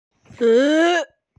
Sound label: wail